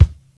Royality free kick drum sound tuned to the D# note.; D sharp key